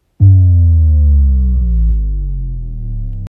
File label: bass drop; Mother